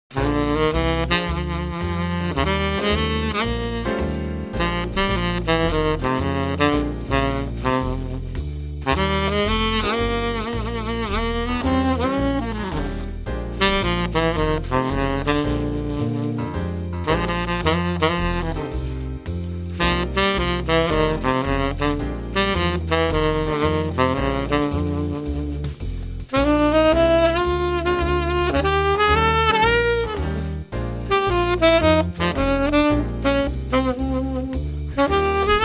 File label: subtone, sax